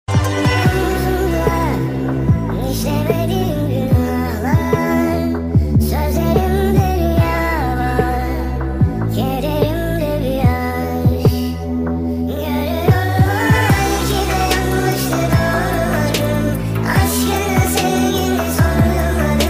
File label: Sad Music